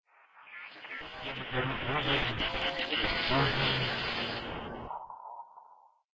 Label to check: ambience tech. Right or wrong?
Right